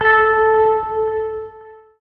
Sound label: Balloon Floating